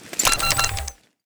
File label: c4 disarm finish; weapons; Counter Strike Model Pack; CS:GO